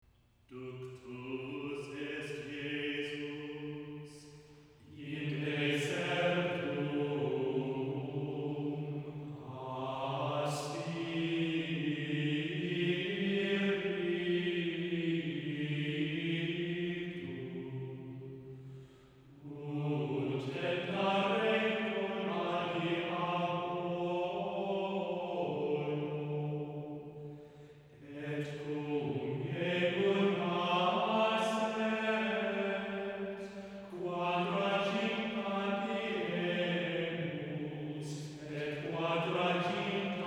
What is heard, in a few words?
Antiphon Mode 1; Ductus Est Jesus